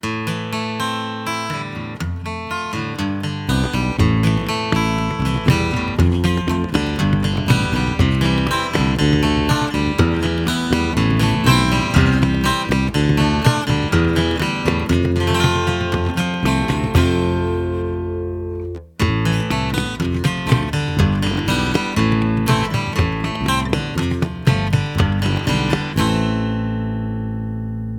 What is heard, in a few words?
jam tracks dorian